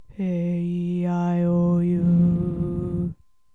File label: aeiou Low